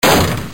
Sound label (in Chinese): 撞门; gamerip; Wild West Shootout